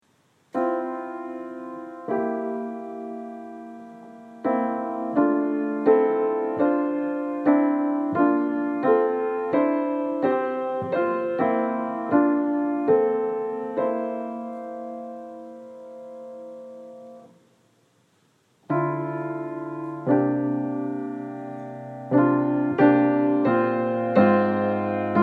piano; easter night

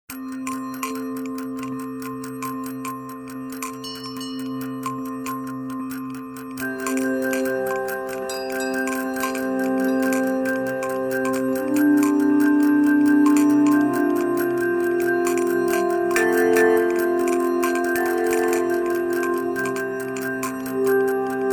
jingles

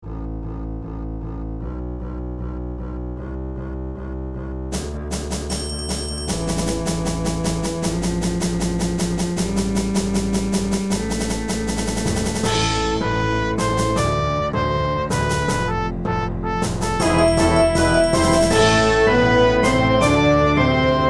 battle

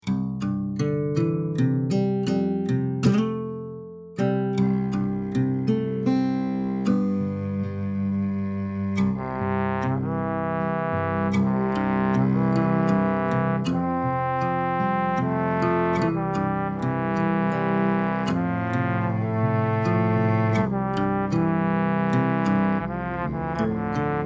trombone

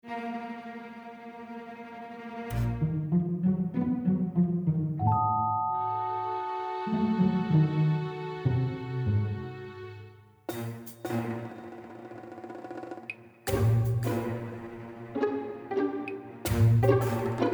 Sneaking Mission

